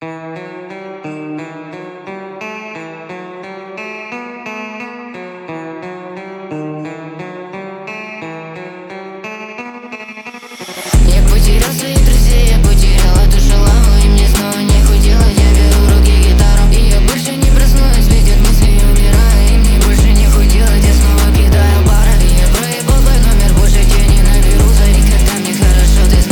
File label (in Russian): gitara